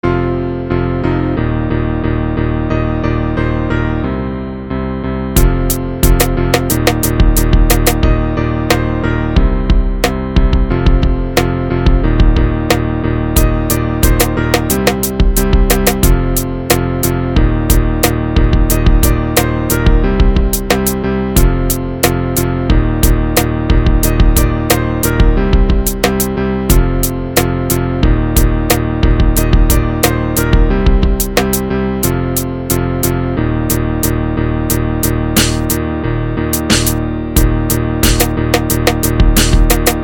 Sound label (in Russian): gitara